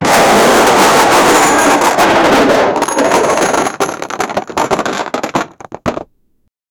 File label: the sound of a lot, boxes